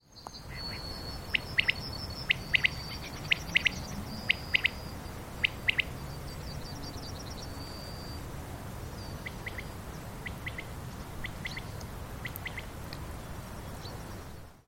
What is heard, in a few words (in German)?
wachtel